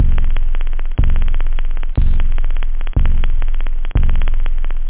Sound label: wood; da capo vol1; drums technobd